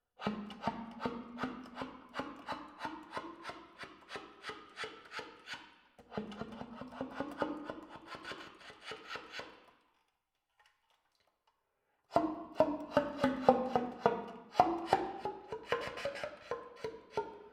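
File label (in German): sop, Tongue Ram, Zuerst mit, dann ohne Mundstück. Sopran; saxophon; spieltechniken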